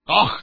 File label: Aaah